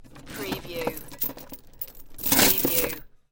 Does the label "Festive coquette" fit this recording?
No